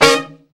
BRA SECT; BRA SECTIONS

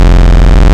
FB Square, wavetables; Ext FB Square; Animoog

